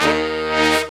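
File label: brass shorts, Brass Sections, Horns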